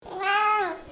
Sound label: meow